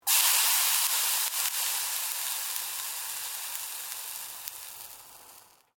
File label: Water Sizzling Hot Pan; Heated water sounds; Kitchen; Household